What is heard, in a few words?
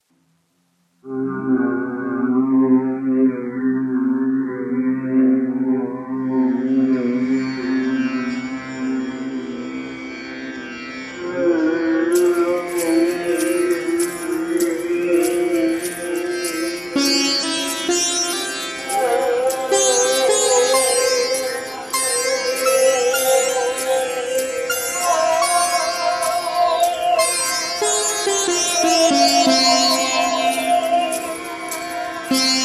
ambient with nature; the dance of shiva